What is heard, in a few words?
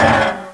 SCRAPE